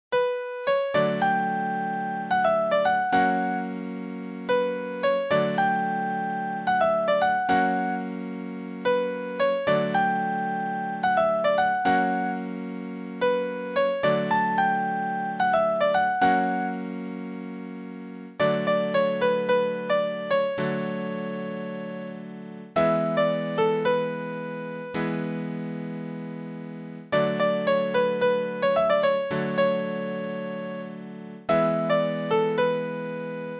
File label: Piano